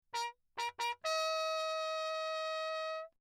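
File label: trumpet mute signal; Perinétventiltrompete; Lechner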